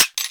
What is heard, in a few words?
Sentient Pistol Mech; Warframe; Amalg